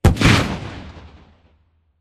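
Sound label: drone death explosion distant; Titanfall 2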